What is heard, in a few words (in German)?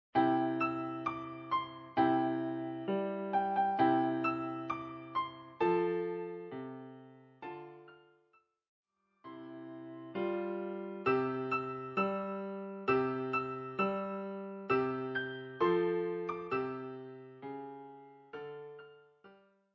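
Jingle Bells Klavier vierhändig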